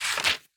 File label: bet Slip Close Betslip; netent